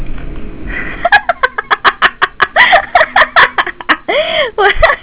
giggle

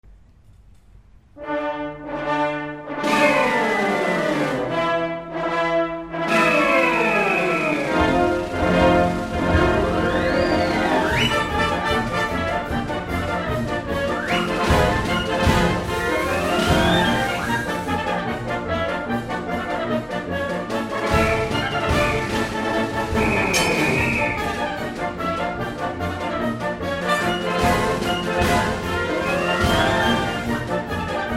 Circus March; Entry of the Gladiators

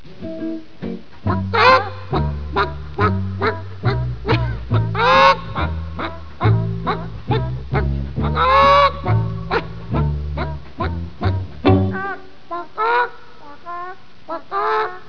chicken

